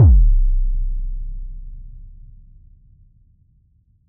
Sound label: dark cinematic sub boom